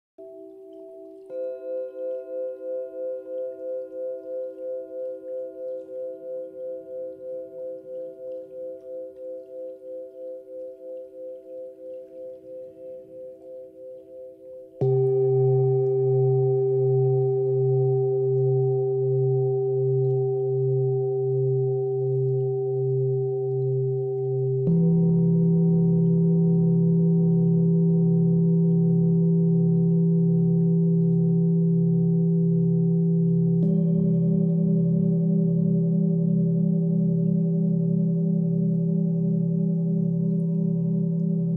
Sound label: Singing Bowls with OM; Meditation; guided; 20 Minute Meditations